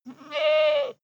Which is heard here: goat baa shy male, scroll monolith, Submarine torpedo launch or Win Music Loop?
goat baa shy male